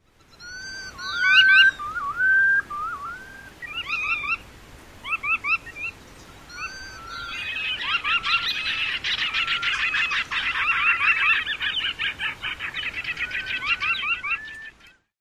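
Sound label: foraging calls, Red Knot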